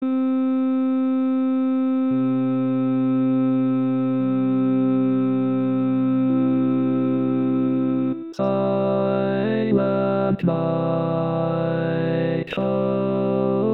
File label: Lead